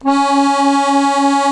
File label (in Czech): AKORDEON C#2; Best Service; Ethnic Journey Sound Cube